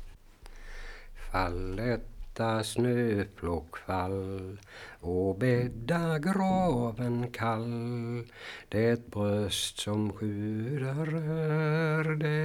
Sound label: Folk music of Småland; Fall lätta snöflock